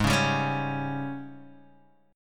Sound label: G sharp Major 7th Suspended 4th, Guitar, DADGAD; 6,4,5,6,4,x